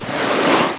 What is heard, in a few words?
Ice Elemental Move Sound; Heroes3